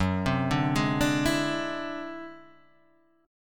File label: D 9th Flat 5th F sharp